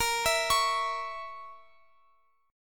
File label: Bb Diminished Bb Guitar Open F; Bbdim arpeggiated